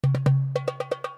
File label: Drums, start up melodies; Milestone 312